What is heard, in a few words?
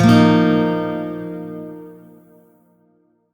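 Shawzin Pentatonic Minor Chord; Music; Warframe; Lotus Shawzin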